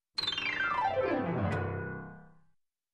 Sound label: Very Fast Piano Scale Down